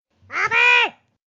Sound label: Angry Squirrel